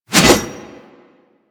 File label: Pole Arm Whoosh; Warframe